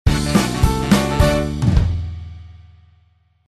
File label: Level Complete